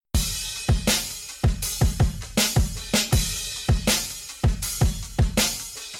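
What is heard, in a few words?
stereo clean drums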